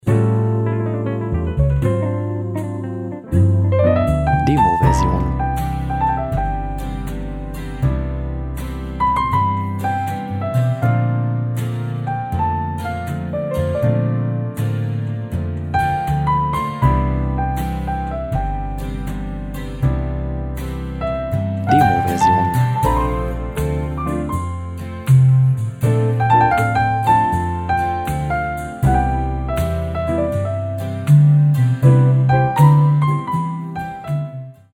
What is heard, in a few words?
Barmusic